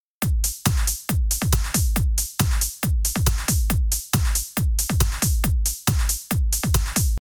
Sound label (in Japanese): ドラムパターン